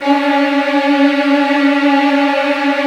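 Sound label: violin trills C#1; ILIO; Kirk Hunter Virtuoso Strings VOL-1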